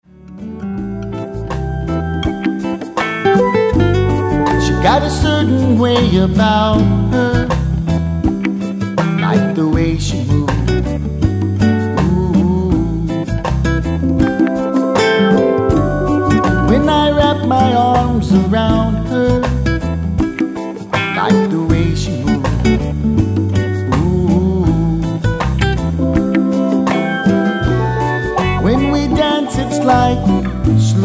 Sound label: Tropical Jazz